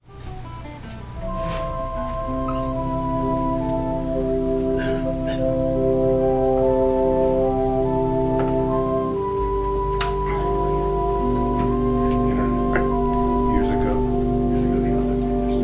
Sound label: Organ prelude; An obedient heart